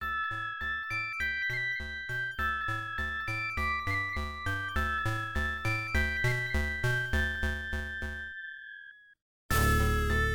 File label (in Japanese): 未使用曲 (FM音源版)